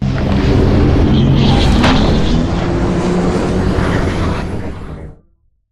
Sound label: strafe close; Beacon; Titanfall 2